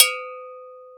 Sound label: Gankokwe Lo Op; Multisamples; Digital Sound Factory Planet Earth